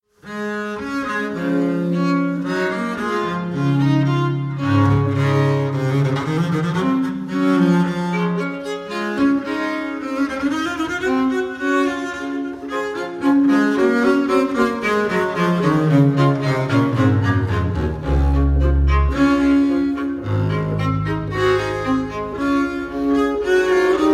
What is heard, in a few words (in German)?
Duetto D Dur Allegro moderato; Sperger; Sperger Trio